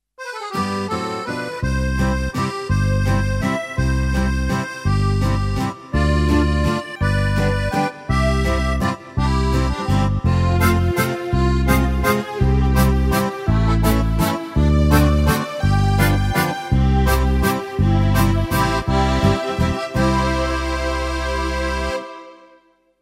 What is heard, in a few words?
Scandinavian Waltz; tyros2; March Waltz